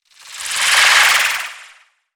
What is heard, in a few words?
Hip Sways; Evolve; Wraith